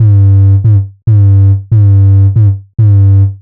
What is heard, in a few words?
140 F BASSLINE, Dubstep; Loopmasters; The New Sound Of Dubstep; BASS LOOPS